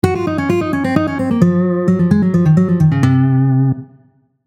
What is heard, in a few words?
c locrian, guitar